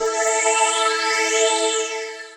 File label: lead syn, Techno Trance